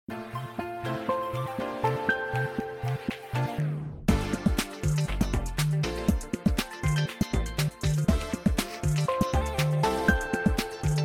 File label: pop remix; Samsung Galaxy S22 SM-S901U; Retro; The Voyage